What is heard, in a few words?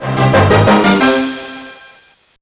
Tada; madagascar operation penguin; gba